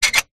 household gate bolt movement

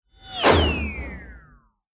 cartoon anime fast whoosh impact whine

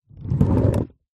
leisure rollerskate short roll wooden deck

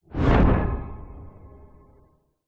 science fiction thud hit whoosh

